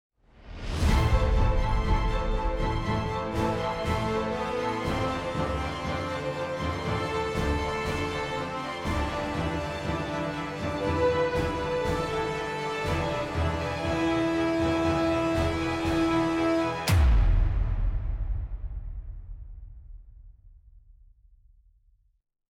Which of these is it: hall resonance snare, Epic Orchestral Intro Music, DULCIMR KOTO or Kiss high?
Epic Orchestral Intro Music